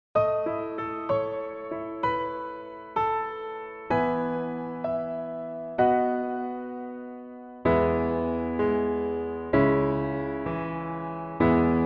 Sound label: Piano